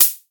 short foot hi hat sound B key, hihat sound tuned to the B note; hat samples